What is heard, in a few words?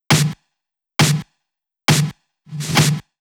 135 DRUMLOOP; Loopmasters; Booty Breaks Vol.2